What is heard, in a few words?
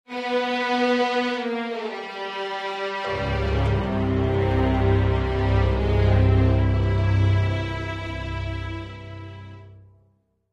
Discovery Stinger